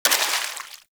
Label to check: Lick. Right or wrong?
Wrong